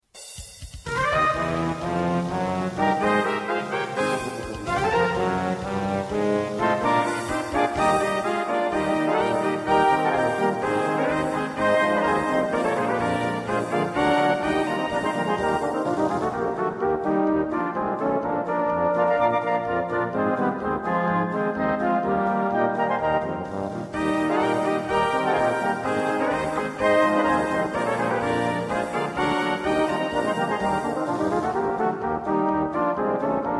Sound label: POLKA